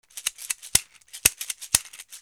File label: pacay